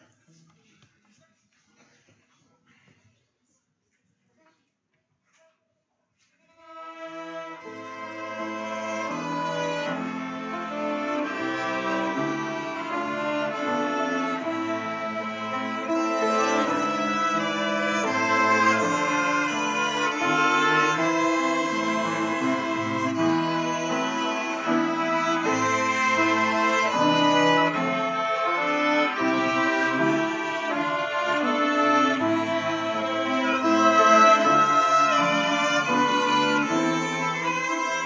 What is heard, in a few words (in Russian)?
Скрипичный ансамбль; г.Давлеканово; Молодёжное общение